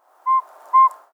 Northern Saw-whet Owl Song

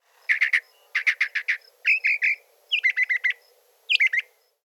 Northern Mockingbird Song

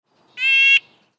Red-breasted Nuthatch Slow Song

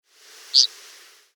Eastern Meadowlark Dzert

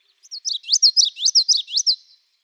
Common Yellowthroat Song triplet series

